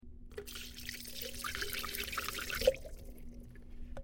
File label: water